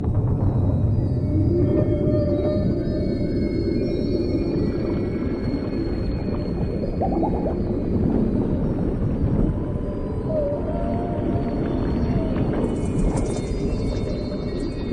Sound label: Ambient Underwater; Rayman 2